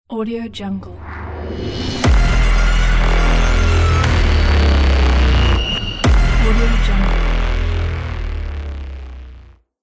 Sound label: dark logo